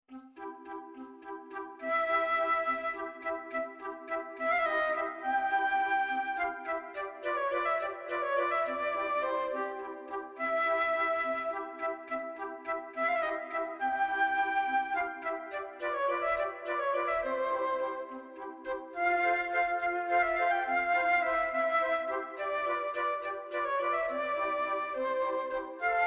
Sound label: Flute Quartet; Theme from La Traviata